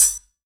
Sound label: Yamaha CS1x Drums